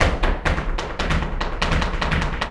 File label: Flamenco, ZAPATEADOSOL